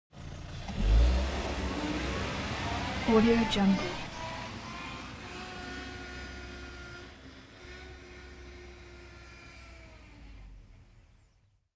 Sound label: transportation car set off reverse gear